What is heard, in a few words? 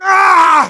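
pain severe, German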